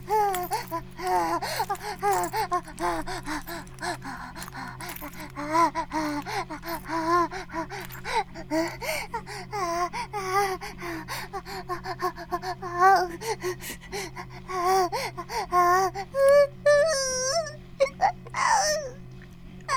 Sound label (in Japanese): バイブ放置 半イキ2回, ASMR